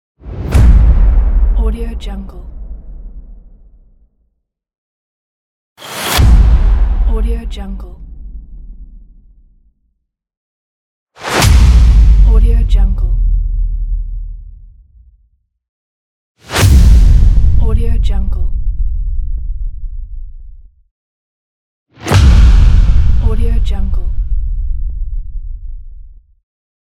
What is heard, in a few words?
booms